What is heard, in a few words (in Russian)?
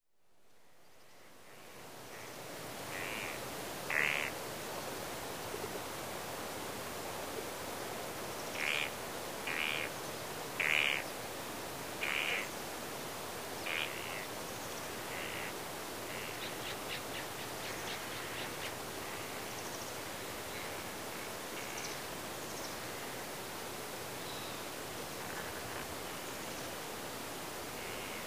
osennij veter i pticy